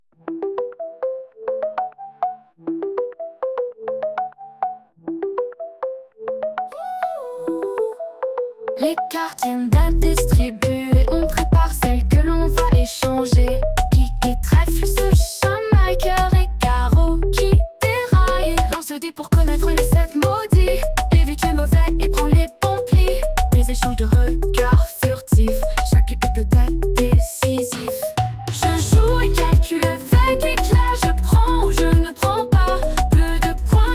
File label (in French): musique jeu